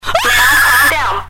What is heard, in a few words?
ahhhh